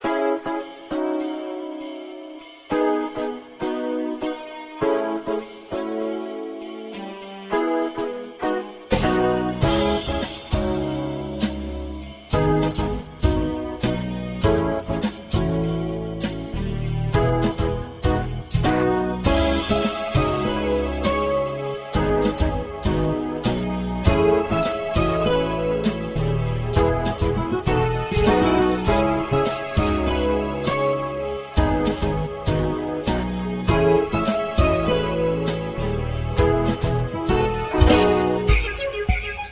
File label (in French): début techno